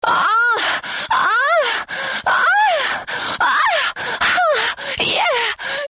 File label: Erotic - Climax